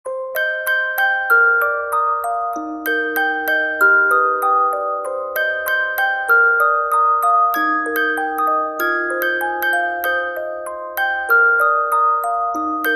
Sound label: Music box